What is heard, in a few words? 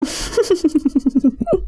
giggle